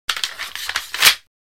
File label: Bamboo Sword Rub; Hollywood Edge; Martial Arts and Human Impacts